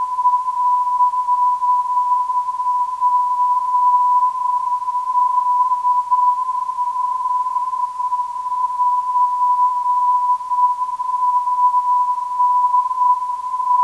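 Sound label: non-directional beacon; PPD; 317kHz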